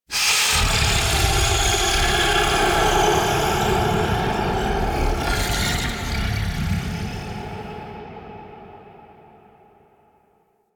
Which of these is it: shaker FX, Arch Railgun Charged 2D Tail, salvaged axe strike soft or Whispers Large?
Whispers Large